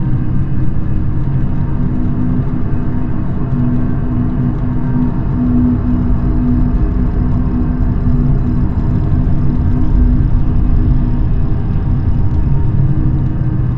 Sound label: corvette hum; nemesis; Stellaris